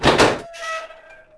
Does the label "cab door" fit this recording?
Yes